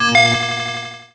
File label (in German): Neufax; FRITZ!